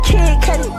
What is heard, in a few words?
adlib